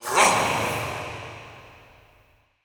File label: Processed Battle Shouts, Viking Vocal